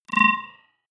data transfer tonal, signale; soundpool

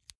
insert xlr extension; objekte; elektronik

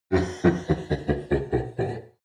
demon dark voice thick laugh; Creatures; kreaturen

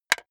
wood board hit hard; objekte; holz

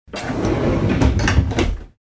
door sliding close

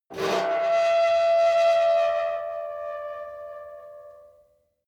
metal squeal saturated intense; industrie-wirtschaft

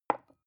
glass put down; objekte

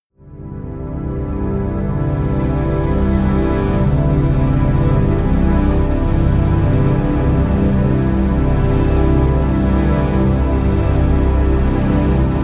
drone ethereal

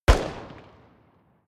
rifle distant shot; comedy-cartoon; pistolen-gewehre

